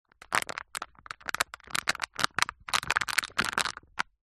household plastic cup disposable handling

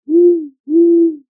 animals owl hoot night

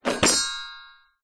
leisure toy musical xylophone plastic drop

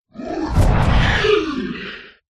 horror monster creature impact growl squeal

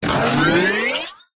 science fiction robot power up surge; zapsplat

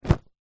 household books pile set down on surface

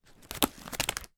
paper pack flick through sheets

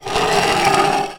sunglasses plastic slide on wood table; household

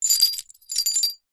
wind chime small metal movement hand muted; household

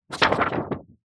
office paper construction sugar shake; zapsplat

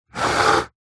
technology computer mouse apple magic slide table

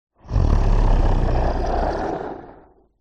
horror evil creature sign groan; zapsplat

